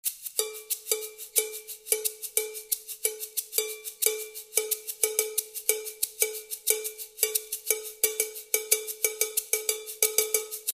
Tribal Style Cowbell, Batucada

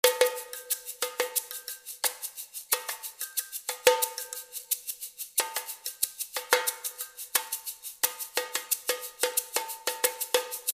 Tribal Style Tamborim, Batucada

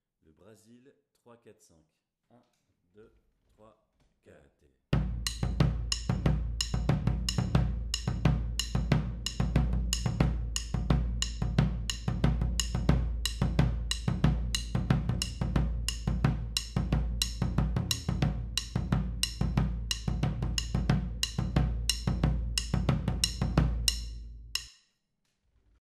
Brazil 345 Intro, batucada

